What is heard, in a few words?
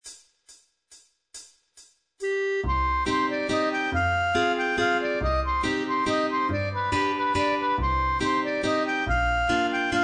Mazurka; d'Embrault